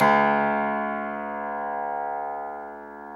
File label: PNO, Tack Piano; Club-50 - Foundations Roland